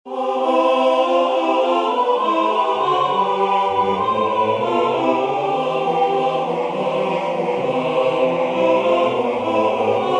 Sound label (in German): Choralterzett; Jesu meine Freude